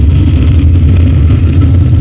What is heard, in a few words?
stone loop; Grounch